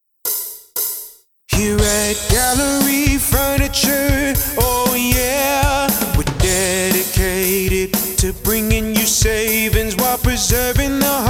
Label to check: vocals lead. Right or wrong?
Right